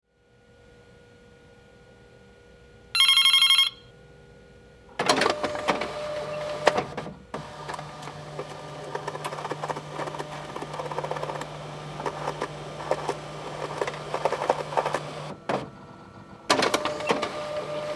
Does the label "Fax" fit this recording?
Yes